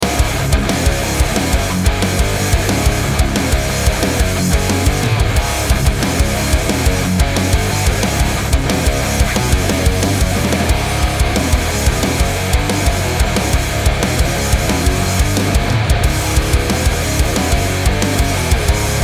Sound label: groove metal 90 bpm short